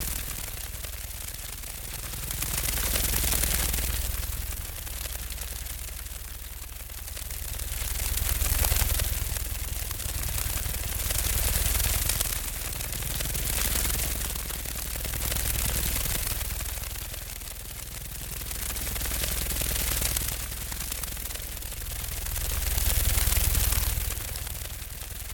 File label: Mutant Insects Beetle Evolution Distant, flying; Red Libraries